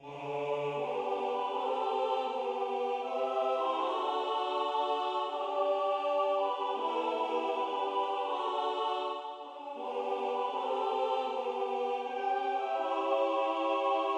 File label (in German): Fruehlingsgruss Tenor